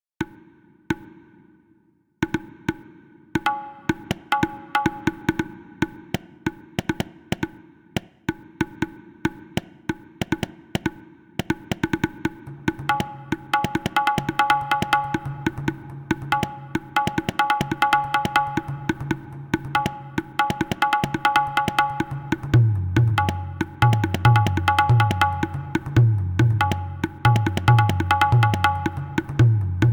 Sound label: atmospheres tabla